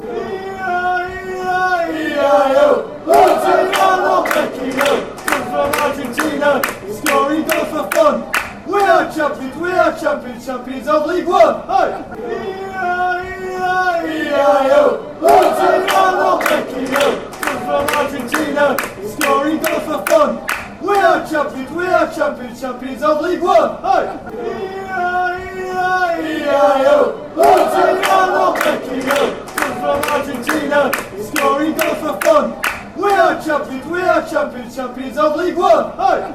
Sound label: eieieio, fanchants; luciano bechio